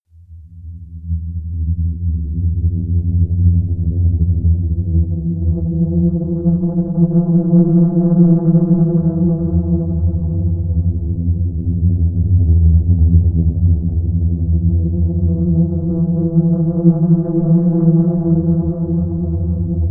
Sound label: fluttering bass